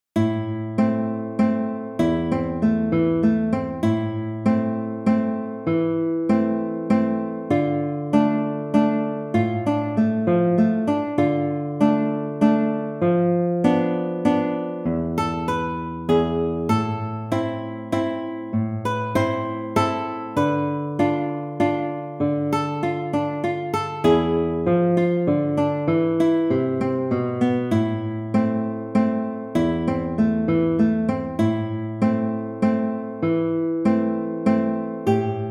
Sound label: spanish waltz; Sagreras, Julio